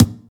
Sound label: snappy kick drum sound g sharp key, Royality free steel kick drum tuned to the G# note; kick drum samples